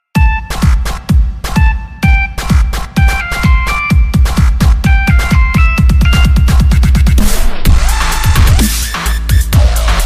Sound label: Dubstep Remix; Samsung